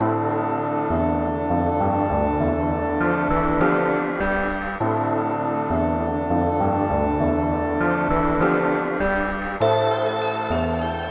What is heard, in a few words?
Acolyte Stinger; Dying Light The Following